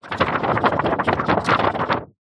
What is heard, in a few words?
office paper construction sugar shake; zapsplat